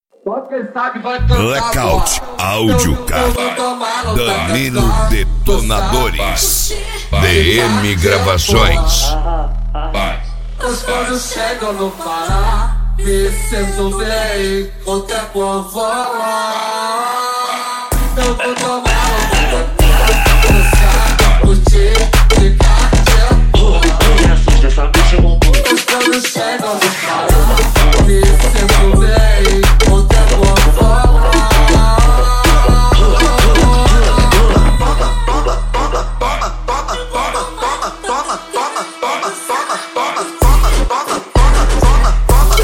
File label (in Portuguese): funk house remix